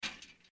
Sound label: Open Gate Cellar; granny 3; android